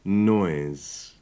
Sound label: noise; шум